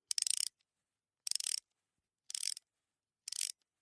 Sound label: Ratchet Spanner Tightening Clicks Various; Tom Hutchings